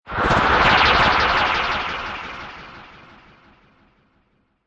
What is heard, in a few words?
Magical Spell Cast Swell; Lynne Music